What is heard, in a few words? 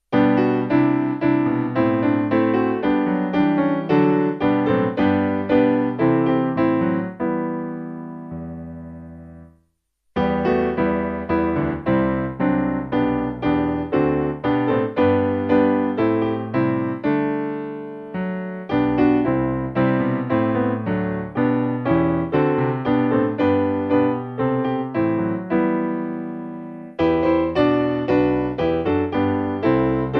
O Jesus Crowned Kingsfold Piano